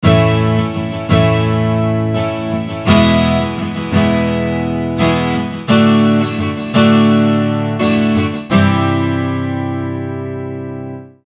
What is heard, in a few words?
12 String Chords